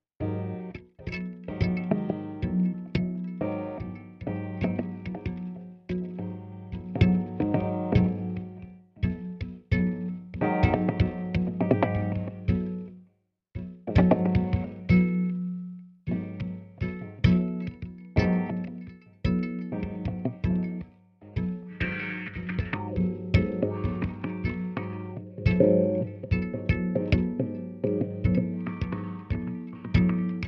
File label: guitar